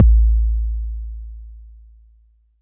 BD; TRX

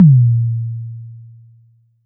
Tom; TRX

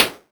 Noise

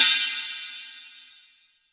Crash Cymbal

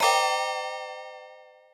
synth bell synth chord

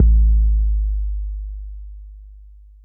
BD 808 Deep

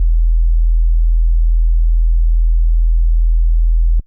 Clean Sine DX100 E0

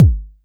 BD; TRX

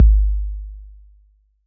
BD 808 Decay

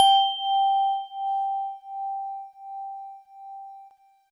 GVibes DX100 G5; good vibes

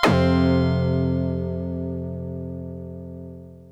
SYNTH Cosmo Harp

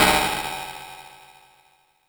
Cymbal FX

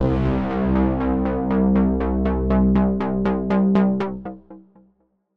analog C3; analog server

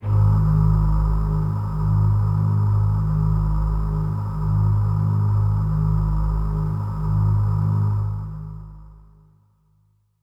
Voices Kawaii G#0

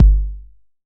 BD 909 Clean Long

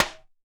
Rim Acoustic Smack

